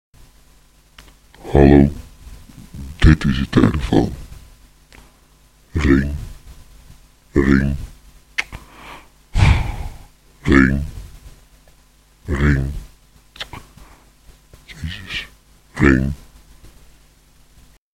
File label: Ring